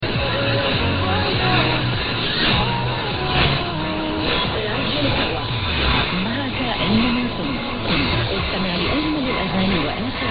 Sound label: 1431 kHz; Sawa